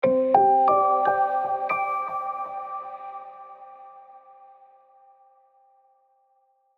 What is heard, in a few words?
jingle